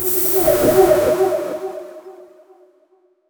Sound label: stretchy glitch echo